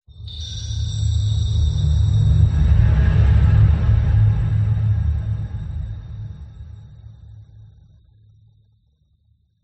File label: cinematic swell boom